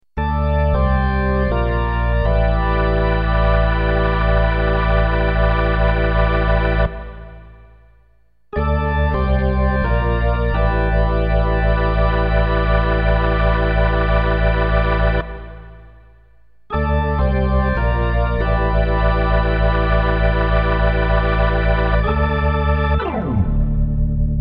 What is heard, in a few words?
Hammond